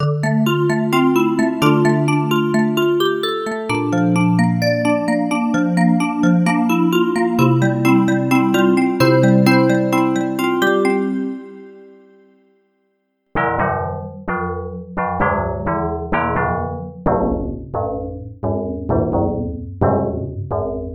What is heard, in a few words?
Synclavier Percussive